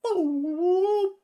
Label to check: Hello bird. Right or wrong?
Right